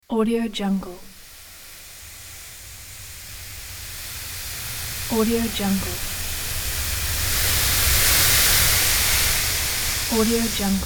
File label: wind sea breeze